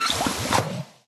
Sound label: Pour beer; Level4 Moe